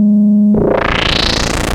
Monotron Sci-Fi

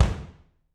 BD, Drums; Bob Clearmountain Drums I

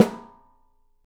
OPEN PICOLO, 3D Drums

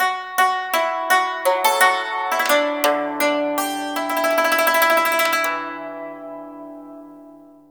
YANG CHING, Heart of Asia; Spectrasonics

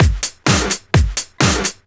Straight Loop 128 BPM, House

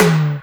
Percussions

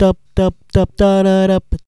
VOCALS; Ueberschall; Jam Box

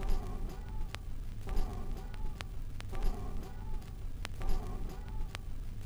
Crackle Loop, Vinyl Noise

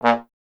Trombone A#

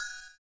CYM, Ethno Techno; ILIO - Ethno Techno

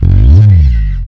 slides, bass; Spectrasonics; Bass Legends CD 1

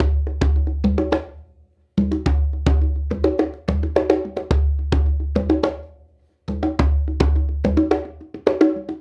Djembe and Conga

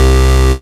Basses Root C; Synth Shots and Basses; Vengeance - Essential Clubsounds Vol.4; Note C

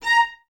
String Ensembles, Violas; A#5; Miroslav Vitous; LINKS ORCH

